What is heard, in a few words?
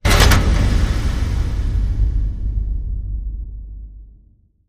Door Locked Boom